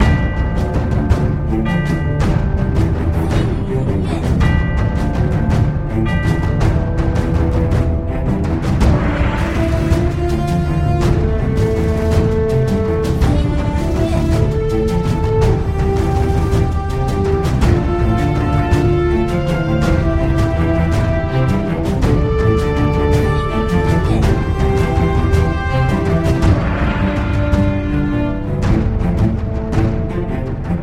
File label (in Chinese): 登录